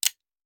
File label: Hand Gun Foley; Sound Ideas; Guns